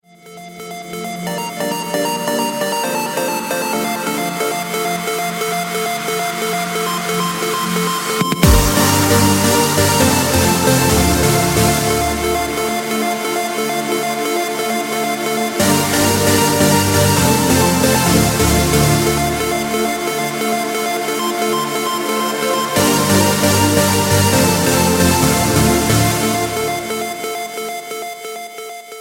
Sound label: trance sound